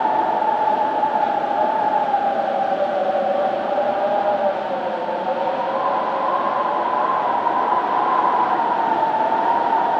Wind

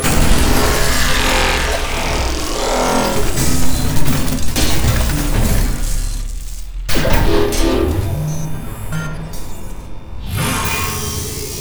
sfx cutscene interface hack; Hellpoint

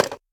Snd phone down; Knuckle Sandwich

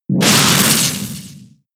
Thunder Punch; pokemon sfx gen 7 attack moves sumo usum

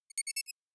Error

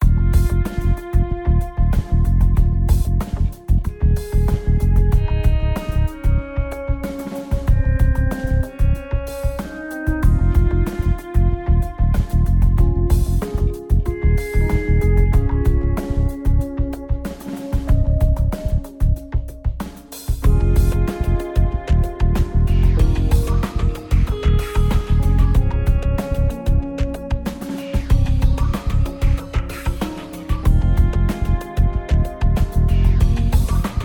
battle; Android

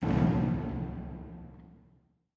waterways tank stop

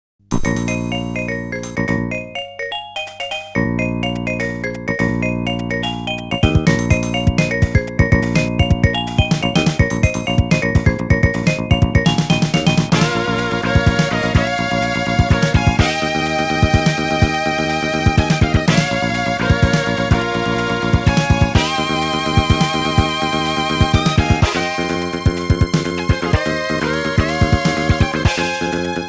Stage; Rockman X1~6 Collection; Cristar Mymine

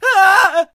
Scared Male; friday the 13th killer puzzle

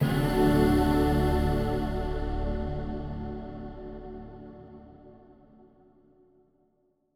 Stingers sting gain

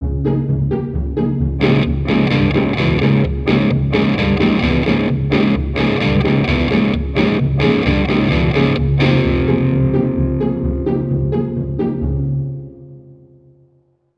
Bari Sax

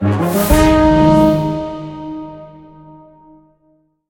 Cleared Jingle; Mountain

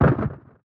Hit; Garagoro

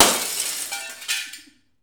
glass break

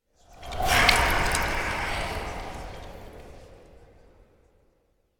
Corruption Block Dissolve; children of morta; Tutorial

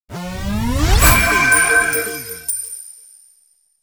player progression xp complete

